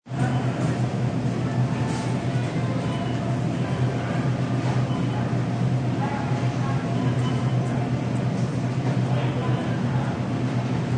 Grcy Amb Anc, Background sounds in a grocery store such as people, shuffling, carts; Background sounds in a grocery store such as people, shuffling, carts, and registers.; Stores And Mall